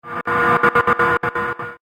Industrial Friction Rhythm, machinery sound effects; Industrial Friction Rhythm (0:02)